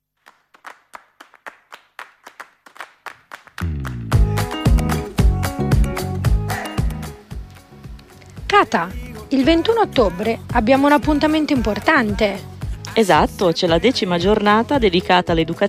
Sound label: jingle; supsi locarno